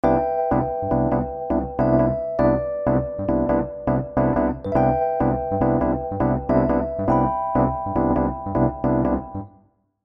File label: session keys electric s; e-instruments